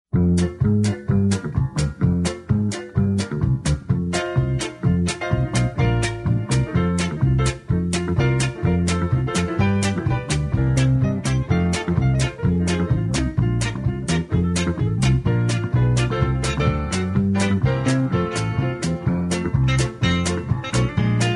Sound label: funky bass